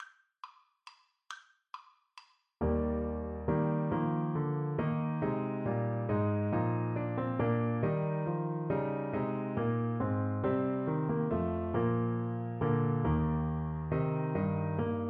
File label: trumpet